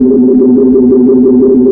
atmosphere; PC Gamer IT CD 41; Daikatana

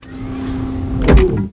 machine; Ultra Vixen

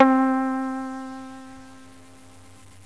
muted guitar; yamahaxg; gmtones

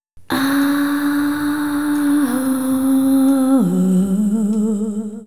ooh

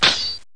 Sword; RpgMaker

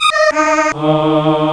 Male; DeluxeMusic2

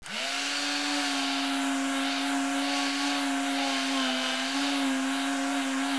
shearin; Mr. Wonder's Greatest Toyshop on Earth; OmniMedia

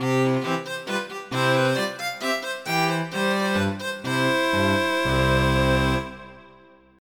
string

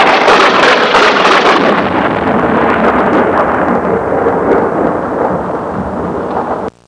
SHARP TH, NATURE

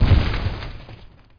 collapse; Zeus

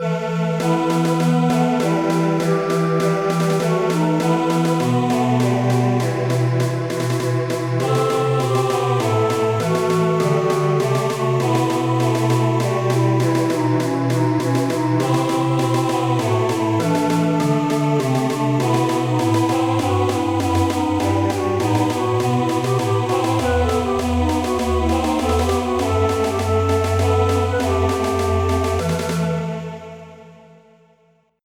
lose

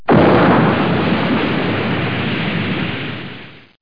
charge; fleet

